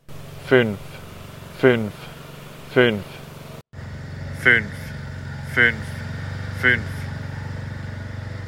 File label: Lawnmower; similar peak USB VS iPad; mikrofon fuer die lernlauscher